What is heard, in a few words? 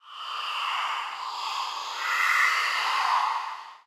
heartbeat; superstriker